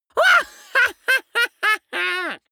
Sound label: laughter spiteful; vocals; comedy-cartoon; soundpool